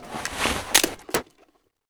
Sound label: Inf Weapons Foley; Red Orchestra 41-45